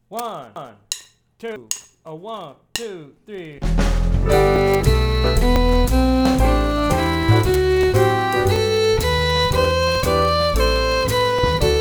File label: Play-Along The G Major Scale